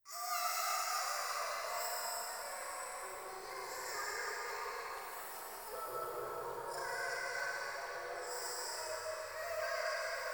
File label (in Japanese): ゴースト シャブ喘ぎ 川のせせらぎ